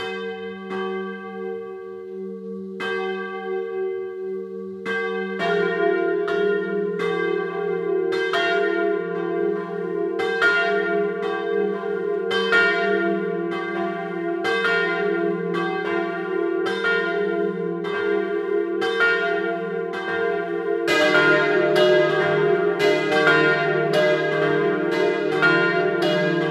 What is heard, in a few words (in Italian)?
Pozzalis, campane